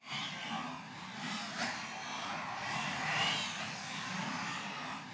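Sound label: magic illusion fear ready loop; skyrim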